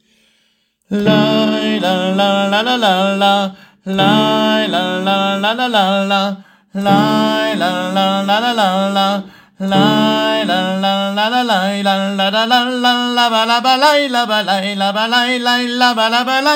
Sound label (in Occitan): airejant coda melodia; ai vist lo lop; Coda - Meodia